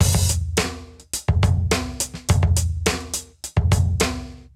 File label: Drums Proc 105 bpm, funk soul; Beats